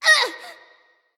grunt hit; smite; nox

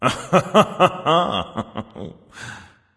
laugh; chaac

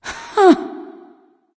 "ugh"; smite; nike

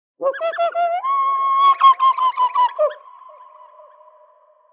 ambience good bird; env s8conquest; smite

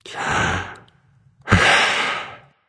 Breath; smite; anubis

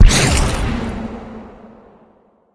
death explode; smite; god artemis

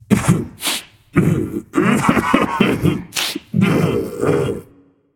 boo hoo; smite; arthur

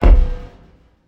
pet fire; smite; vulcan

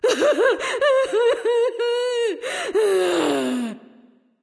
boohoo; smite; voicepack; hel

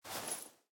cloth; smite; daji

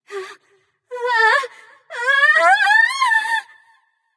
fear light; smite; voicepack; hel

